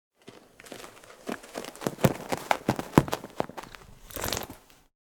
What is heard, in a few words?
Cat Sharpening Claws Shaking Head